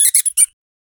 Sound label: aggressive and loud rat, rodent